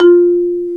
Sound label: Bass Marimba